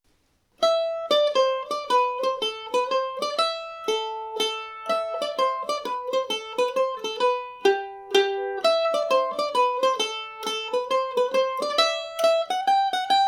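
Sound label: A dorian, irish mandolin; George Rowley’s; G&O #34 mandolin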